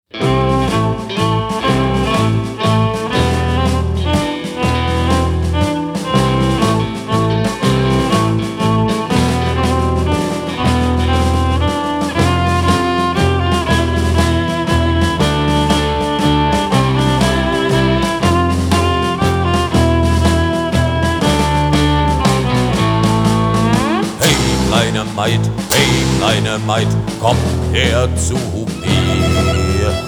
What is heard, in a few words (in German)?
Walzer